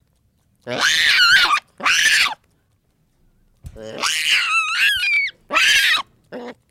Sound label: Baboon Screams Wails